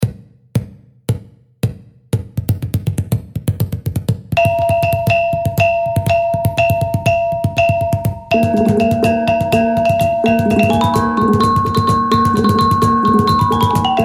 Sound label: GONG CEREMONY